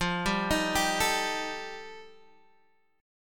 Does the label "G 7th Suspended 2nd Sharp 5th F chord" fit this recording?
Yes